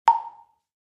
Percus Plastic Block, percussion blocks

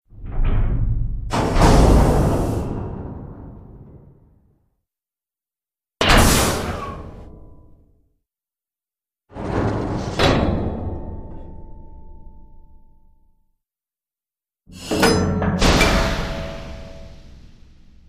Massive Door Close, pneumatic

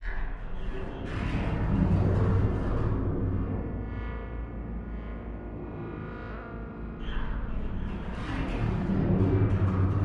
Fracture Eerie Gritty, metallic

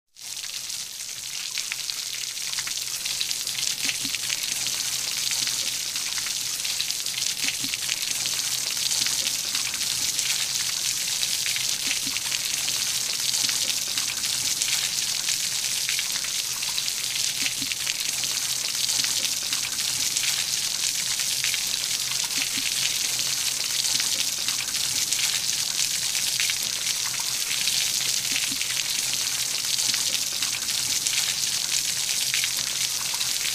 Rain Steady Heavy Rain

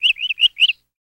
Canary Call Chirp

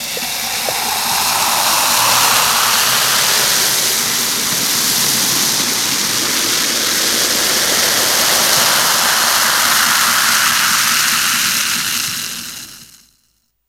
Bubbles Fizz From Pou

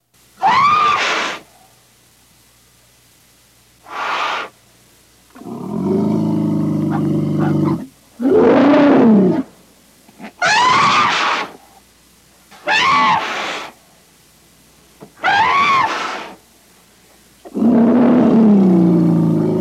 Elephant Growls Roar

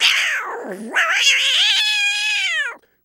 Cat Angry Squeal Meow

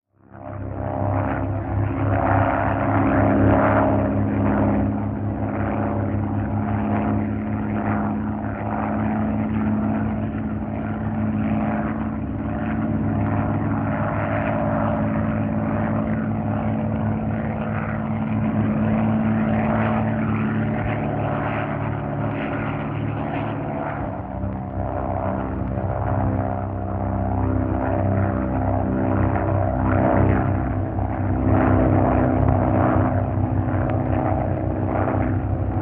Prop Sngl Stdy Flight, ww2 fighters

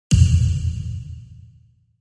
Impact Metallic Thum

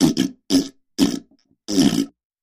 Bowl Fart Sporadic

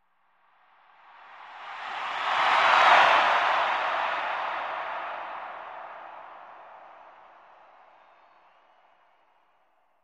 Arena Crowd; Crowd, Huge Cheer With Doppler By.

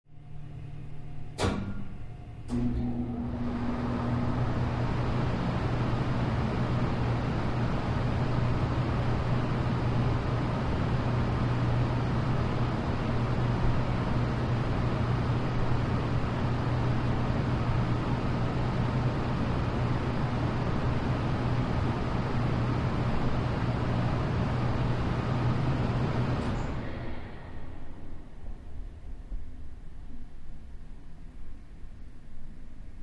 Switch On Ac Run Off Ai, air fill

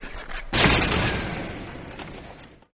Hockey Player Skate

